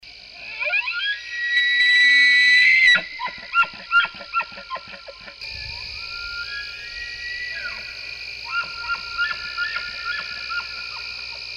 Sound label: bull elk bugle